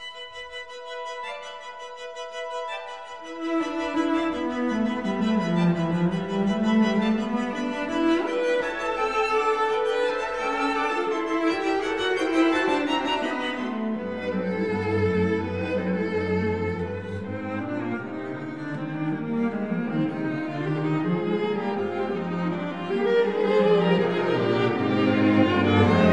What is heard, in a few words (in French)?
quatuor; debussy; impressionniste